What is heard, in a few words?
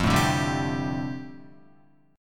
F 6th Add 9th, Guitar, F6add9 chord; F6add9 strummed